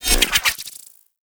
Spin Attack; Warframe; Hyena Micro; Attacks

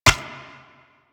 Ricochet; Warframe; Cowgirl

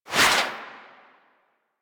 Rock Punch Swing; Warframe; Brawler

